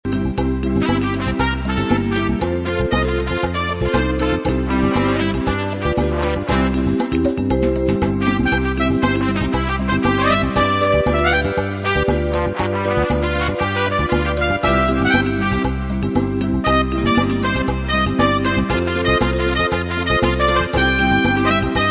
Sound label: Samba